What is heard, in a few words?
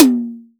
HTOM